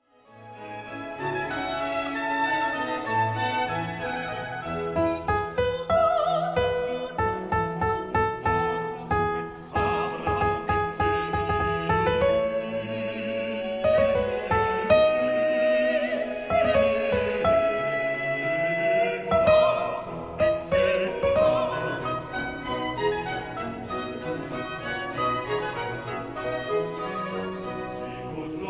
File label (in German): Sopran; Magnificat